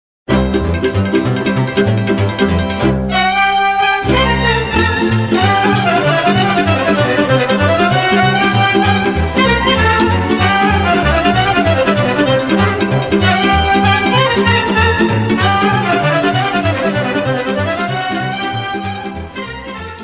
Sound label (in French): Sirba - Roumanie; Sirba Moldovenilor